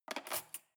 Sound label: cutlery cake fork drawer scrape